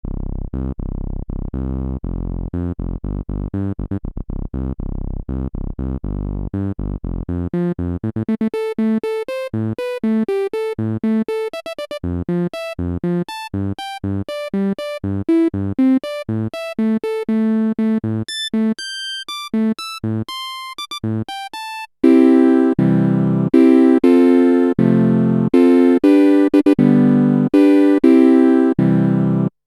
e-mu virtuoso 2000 filter bassbox-303 lpf 12pole q050